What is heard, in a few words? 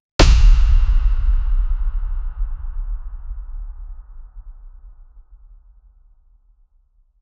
impact hit, crack; impact hit 06 seconds